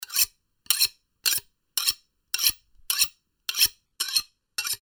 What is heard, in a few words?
knife scraping